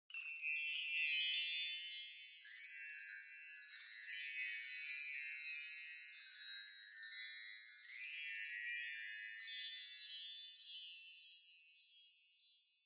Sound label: Wind Chimes, Mid